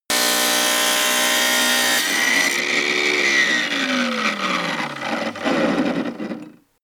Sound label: tile cutter on load